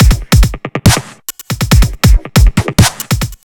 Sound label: Hammer Kick Beat